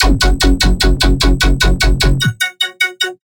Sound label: Melodic Bass F# 150; One-Shots; Bass Hits (Melodic); Celestial; Ghosthack; Color Bass Essentials